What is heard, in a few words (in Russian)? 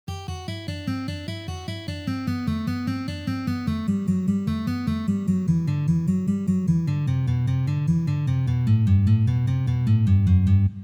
Uprazhnenie dlya igry gammy Mi minor